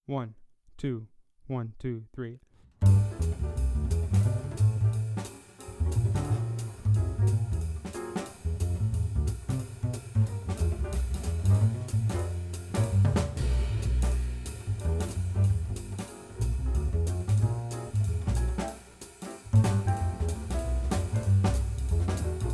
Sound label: bass